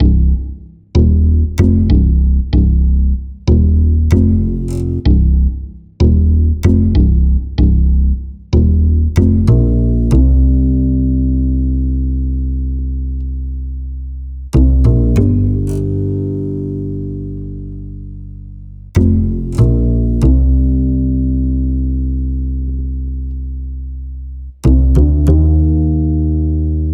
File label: Bass